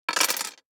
DICE on Glass, Throw and Roll, Standard, 6 Six Dice; Samples; ArticulatedSounds; DICE Sfx Party (Extended)